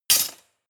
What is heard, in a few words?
cutlery large drawer place hard